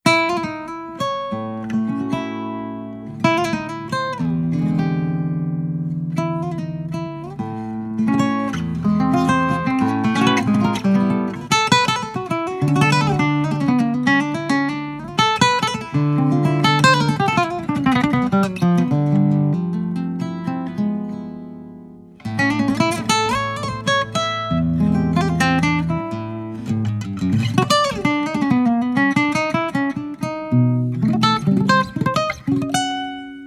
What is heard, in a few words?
Archtop Nylon